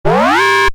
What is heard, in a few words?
Electronic Alarm Loop; Electronics